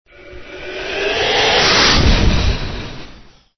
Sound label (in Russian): proletevshij mimo reaktivnij samolet